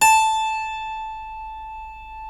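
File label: A4 clavinet right, Keyboards Acoustic; USB Soundscan vol.17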